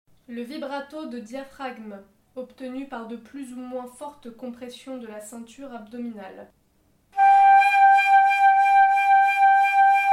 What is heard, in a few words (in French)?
vibrato